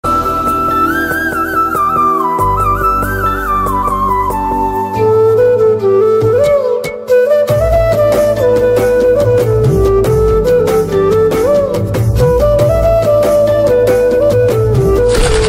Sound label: love failure bgm